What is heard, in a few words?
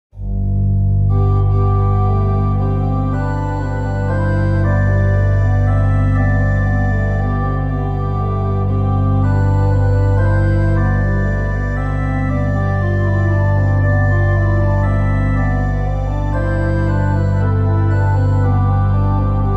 Chorale prelude